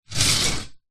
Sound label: office metal wire tray drawer slide